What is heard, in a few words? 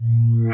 Spell Tower Hold Trap